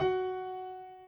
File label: piano intro